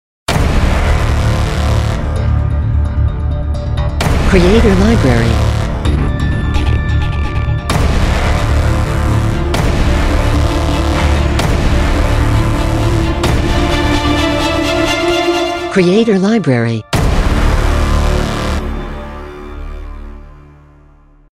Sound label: Cinematic Logo Ident; Logo-Idents